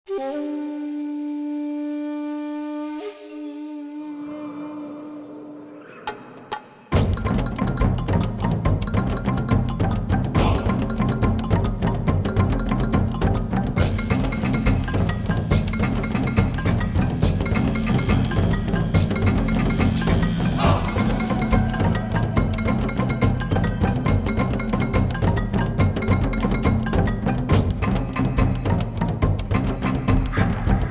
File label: African - Old rite; anno 1503 gamerip